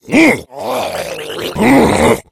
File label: shoved, npc infected